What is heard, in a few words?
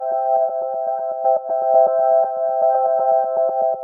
force Canadian Boards; gravity-force-lab-basics